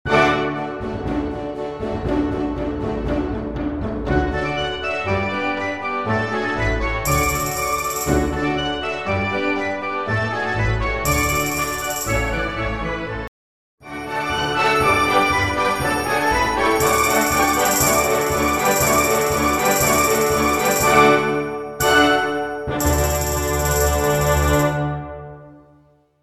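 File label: Campagne Polka, Full Orchestra Accompaniment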